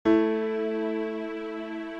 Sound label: A E chords